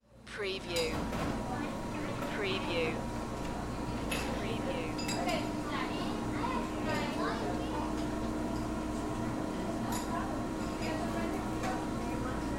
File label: Ice Cream Parlor; sound-effects-bible